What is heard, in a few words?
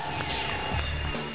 money fall wipe; korea